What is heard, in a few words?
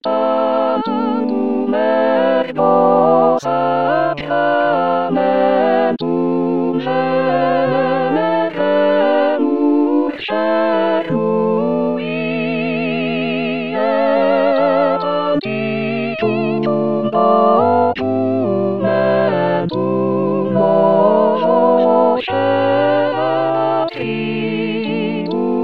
Tutti; deodat de severac; Severac Tantum ergo